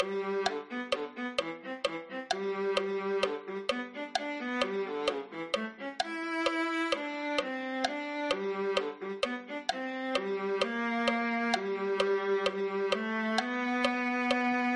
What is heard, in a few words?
viola